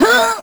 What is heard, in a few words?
squish; Crypt of the NecroDancer